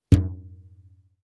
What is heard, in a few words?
Cartoon elastic pluck wobble; boing